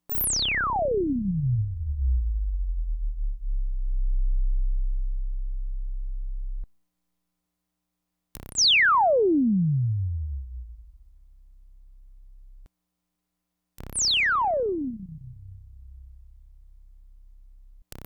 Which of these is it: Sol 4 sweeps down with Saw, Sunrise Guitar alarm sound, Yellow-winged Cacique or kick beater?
Sol 4 sweeps down with Saw